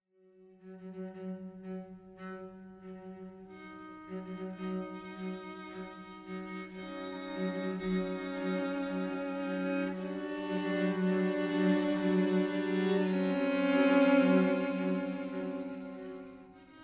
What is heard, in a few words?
string quartet; rathaus; no. 3 mvt. ii